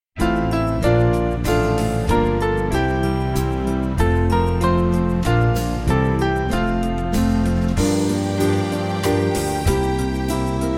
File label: Lamb Of God Gentle Jesus, Band; Small Band